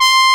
BRASS C4, pop brass; AKAI S6000 CD-ROM - Volume 1; BrassWind

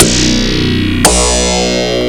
PPG BASS; Rubber Chicken Software Sample Bank

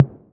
808 SOFT; Wizoo - Platinum 24 Electronic

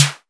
SD noise volume; Sonic-Core EDS16-V2

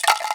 RATTLE, INDIAN PERC; Didgeridoo and Other Primitive Instruments

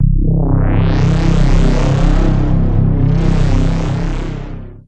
Sweep, Synths BASS

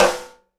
SNARE RIM, Live Snares

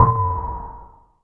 RM BELL DRUM; Zero-G Ambient 1; ANABELL PERC

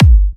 G, kicks; markus hakala kicks

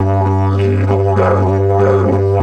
G 100, DIDGE 100BPM, Didgeridoo

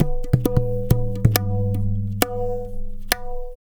Tabla, 135 BPM; Zero-G; Pure Tabla

